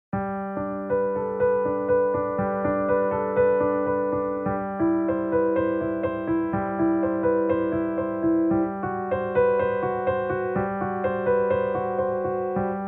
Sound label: Prelude in G Major, piano; BWV 1007; bach